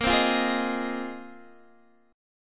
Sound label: A sharp 7th Suspended 2nd Suspended 4th, Guitar, DADGAD; down